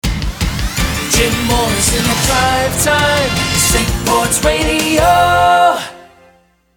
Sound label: epic, sung jingle with music